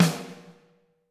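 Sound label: snare drum, Drums; Bob Clearmountain Drums I; SD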